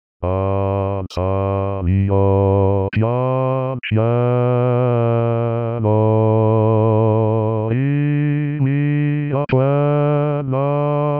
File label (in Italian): basso